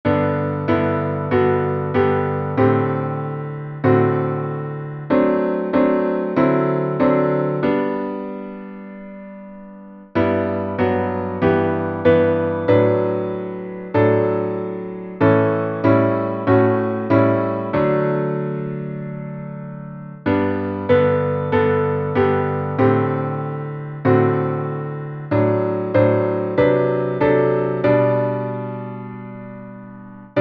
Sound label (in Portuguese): salmo instrumental